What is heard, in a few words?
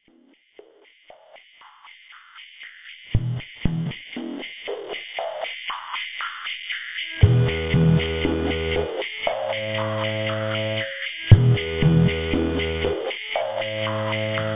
ALL GUITAR